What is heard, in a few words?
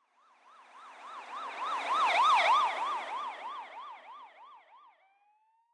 Ambience Police Siren; subway surfers city sound effects